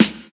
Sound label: boom acoustic snare sound g sharp, Royality free snare tuned to the G# note